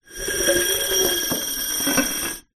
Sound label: household drinking glass scrape on metal draning board; zapsplat